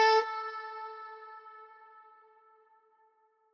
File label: Wet One Shot A, Vocal, Tonal One Shots; Cymatics; Euphoria Vocal Sample Pack